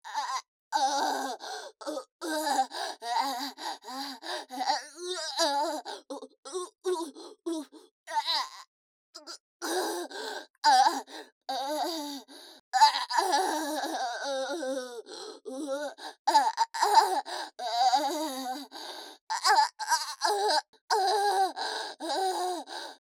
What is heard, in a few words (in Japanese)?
喘ぎ声